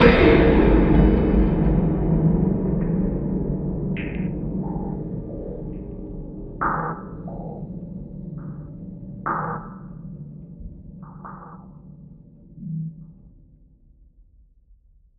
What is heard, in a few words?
STING sub basement stare, Cinematic Metal Impacts